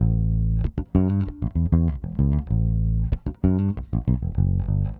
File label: Bass, 70s Analog Funk